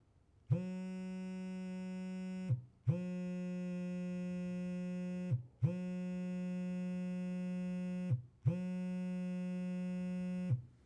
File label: Cellphone Vibration On A Wooden Box